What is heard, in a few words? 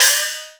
DA LUO B2, Asian Traditions; USB Soundscan vol.60; AKAI